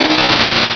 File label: cry; source of the sovereign